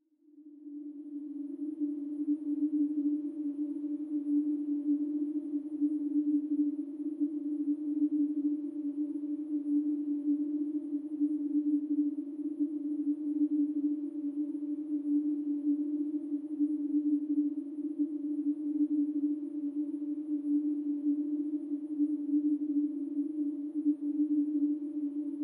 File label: Mysterious Background Music